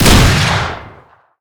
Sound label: fire, m16 weapons